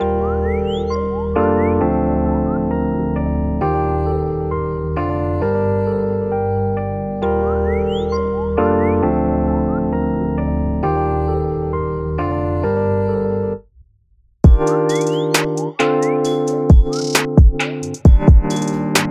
CHORDS

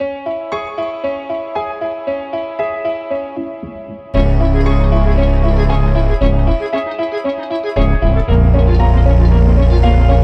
Vintage Hip Hop

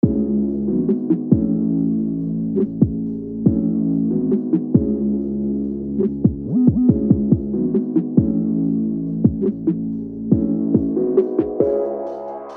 Beat

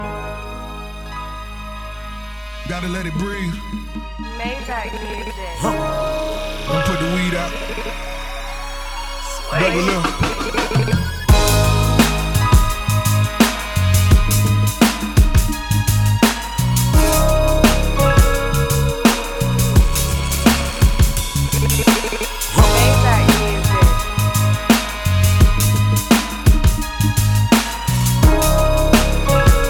TYPE BEAT

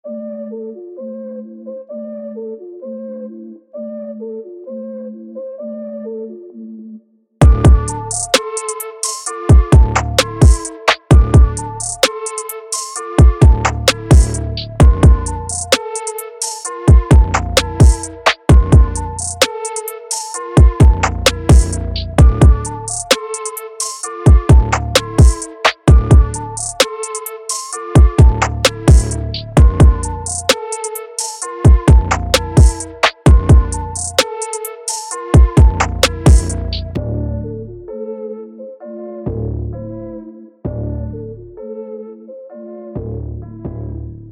Flute

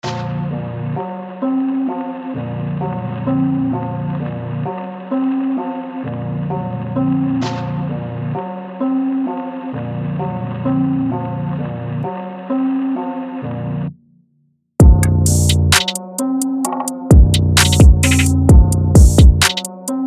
Noise

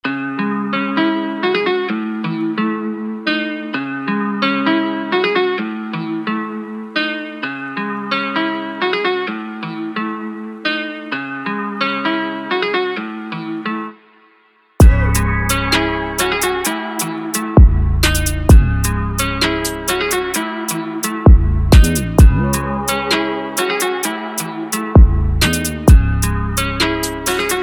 Drill

